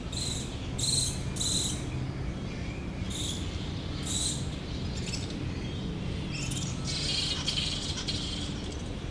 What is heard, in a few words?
Bats